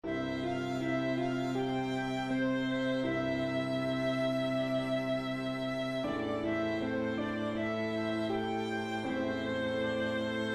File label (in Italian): Base classica + solo; Marco Andrea